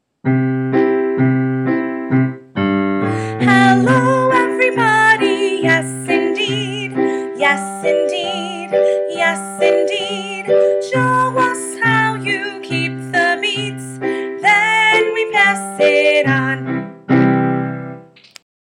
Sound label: hello song